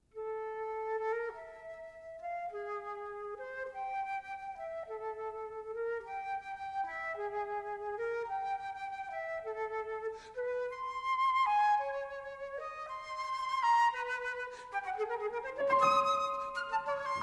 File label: Duo For Flute and Piano